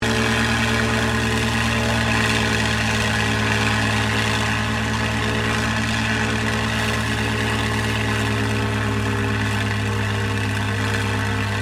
AIRCRAFT CAC CA-18 MUSTANG MK23 OPERATING DECCELERATING STEREO, WW2 Mustang Fighter Plane; Airplanes